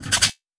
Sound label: click